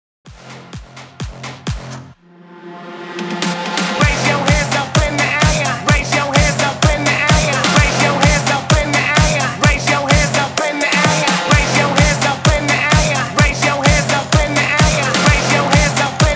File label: HYPE REBOOT 128 BPM